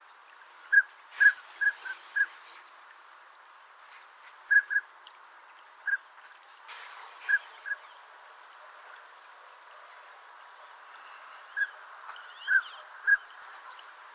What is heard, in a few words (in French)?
canard pilet